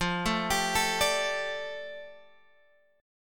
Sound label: F 6th Add 9th F down Guitar Open G, chord